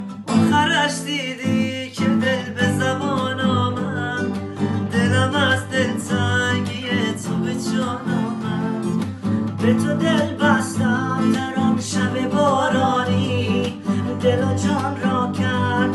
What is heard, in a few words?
Group Version With Guitar